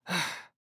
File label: Vocalizations; Garren Deora Intro; Envoy; Garren Boss Arena